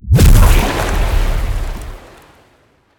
fire; smite; cthulhu

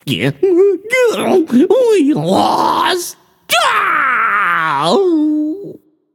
boohoo, voicepack; smite; jorm

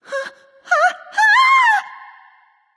fear; smite; pele

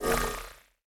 Hover; smite; god cupid skin13

